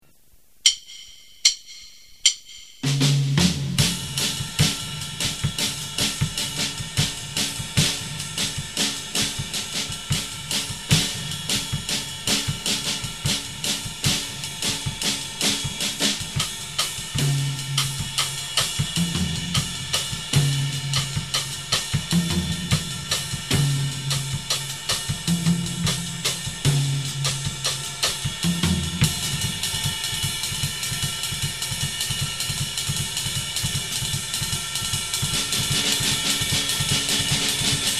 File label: samba